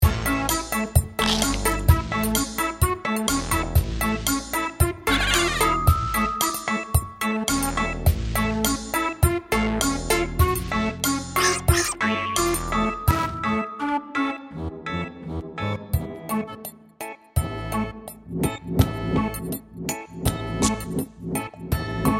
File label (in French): limonaire